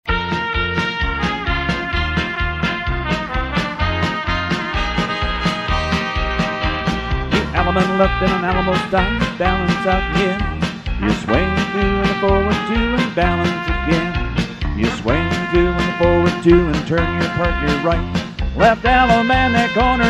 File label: vocal